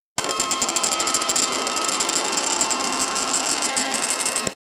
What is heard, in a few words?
the sound of the wheel, gambling